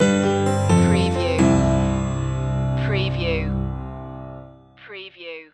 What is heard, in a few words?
piano